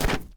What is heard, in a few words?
dirt land, footsteps; chief